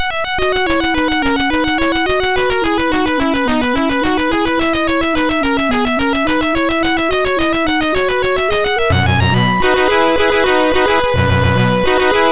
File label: MOS6581R4, sid